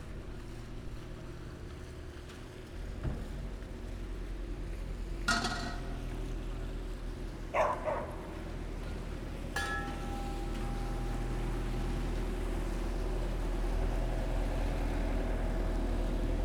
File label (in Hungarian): balra munkavegzes, kutya es motorcsonak; velence; olaszorszag velence 2014